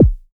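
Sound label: kick, ARP Odyssey Drums; Polyend; Simulacrum Sounds; 1 Shots; Synths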